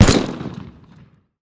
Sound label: tools gun